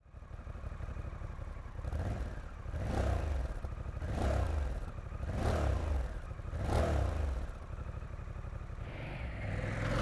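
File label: Pan Am Closed, exhaust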